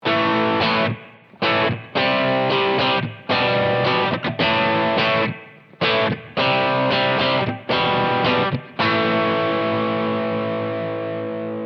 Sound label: Crunchy Rhythm